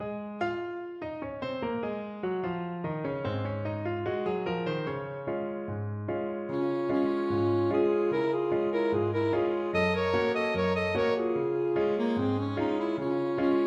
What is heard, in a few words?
alto saxophone duet